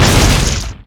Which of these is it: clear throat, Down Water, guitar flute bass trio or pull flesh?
Down Water